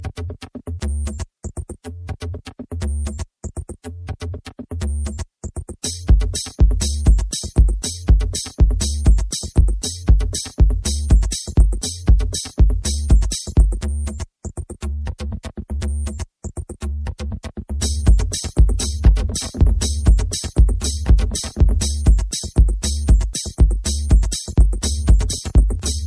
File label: Tribal Mix